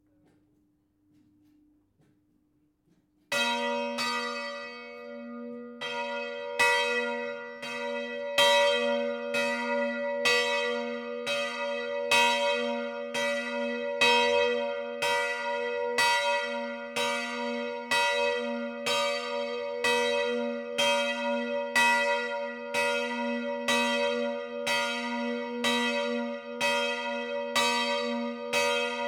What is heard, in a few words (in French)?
Lausanne cloche; campanologie